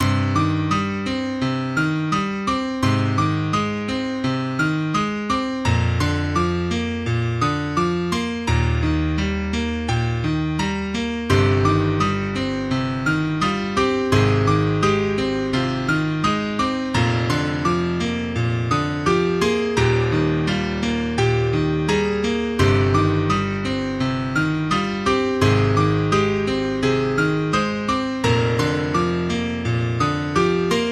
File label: Sad For Piano